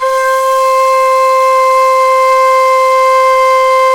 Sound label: NYE FLUTE; World Combo